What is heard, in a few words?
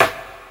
low snare d key; 90s; snare drum samples